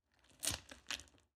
squishy short; soundscrate

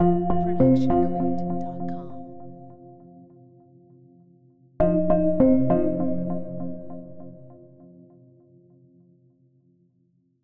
simple evening news logo

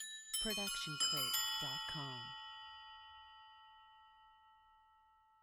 Christmas Bells; SoundsCrate

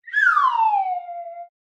Slide Whistle Down; SoundsCrate

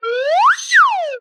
slide whistle short crescendo

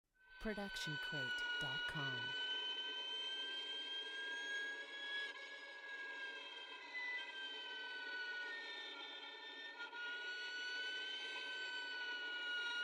Violin Harmonics Long

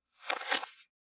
Magic Paper Movement; SoundsCrate

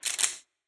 gun cocking; soundscrate

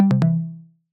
click fx tonal; soundscrate

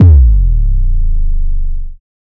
Bass Drop; SoundsCrate

